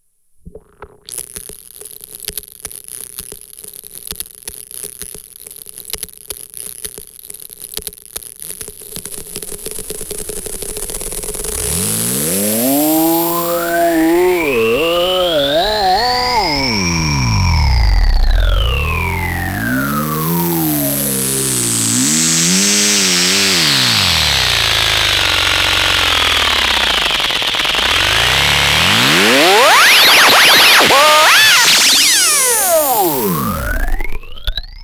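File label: vocal with sweep; Sweep with weirdness; Soundfiles from the modular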